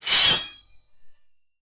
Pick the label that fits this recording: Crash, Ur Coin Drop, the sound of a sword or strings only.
the sound of a sword